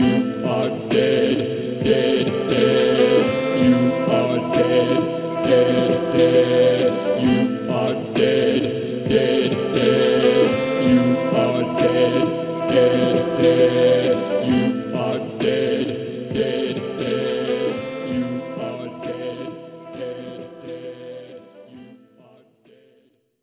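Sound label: game over, background music; ragemap2024; giegue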